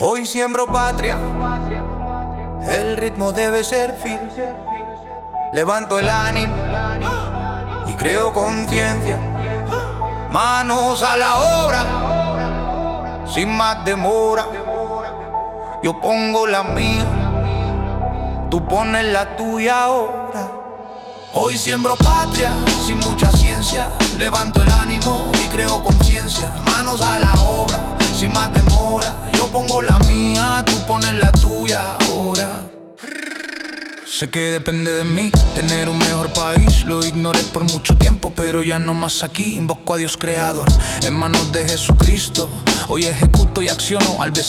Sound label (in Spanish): rap